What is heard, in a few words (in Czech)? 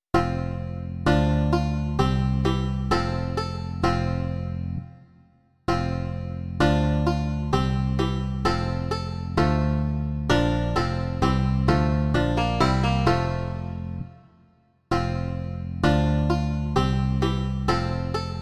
banjo a baskytara; Ó Bože, jenž jsi v nebesích; Imaginativní verze